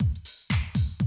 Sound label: Classic Drum Loops